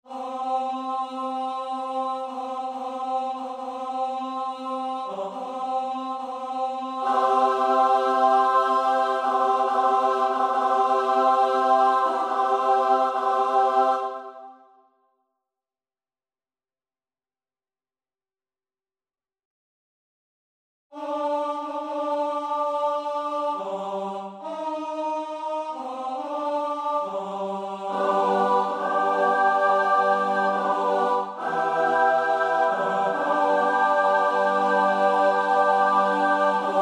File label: S A T B; Bruch (Max); Moses op.67